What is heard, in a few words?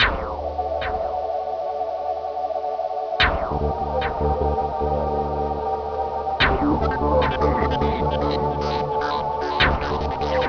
Trance